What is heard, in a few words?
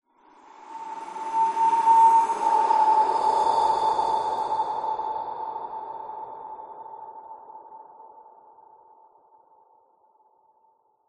horror breath reverb ghost